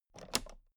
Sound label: toolbox latch close regular